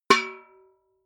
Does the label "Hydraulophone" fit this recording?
No